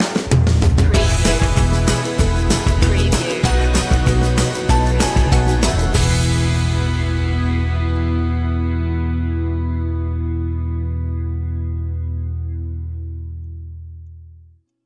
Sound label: uku girl stinger